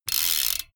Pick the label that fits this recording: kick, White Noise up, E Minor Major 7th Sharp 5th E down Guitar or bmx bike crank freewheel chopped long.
bmx bike crank freewheel chopped long